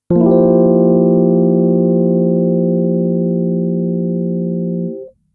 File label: Maj7sus